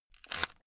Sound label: headphone extend quick